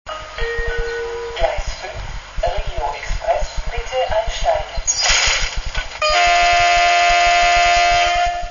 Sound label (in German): Steuerwagen